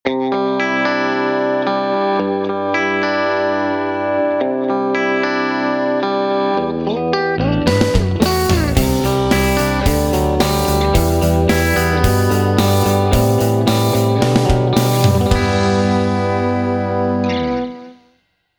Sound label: Irish Chime Eminence The Wizard